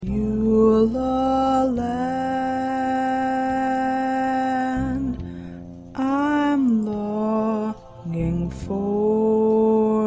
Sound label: 2nd Tenor